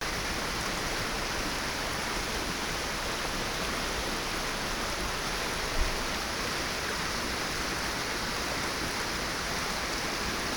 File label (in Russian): vodnij potok techenie vodi